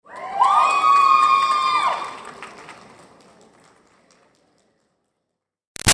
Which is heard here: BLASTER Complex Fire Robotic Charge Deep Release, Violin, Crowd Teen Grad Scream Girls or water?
Crowd Teen Grad Scream Girls